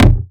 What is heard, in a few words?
Dup, Kik, One Shots; Sonic Foundry (Sony Creative Software) - Future Retro Dance Excursions